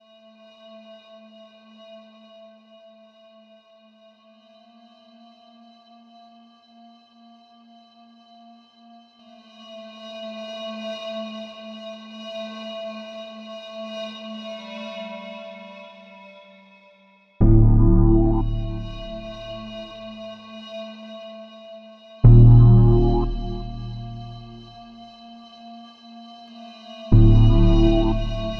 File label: Horror; Tension 공포